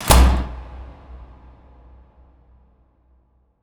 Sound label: impact hatch; Hits